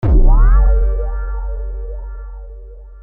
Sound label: confused bwam